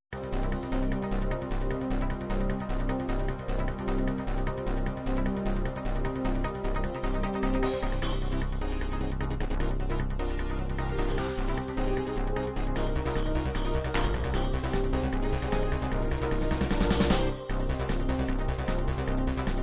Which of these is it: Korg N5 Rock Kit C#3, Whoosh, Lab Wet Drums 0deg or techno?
techno